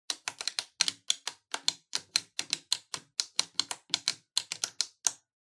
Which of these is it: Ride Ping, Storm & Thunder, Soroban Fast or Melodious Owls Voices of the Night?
Soroban Fast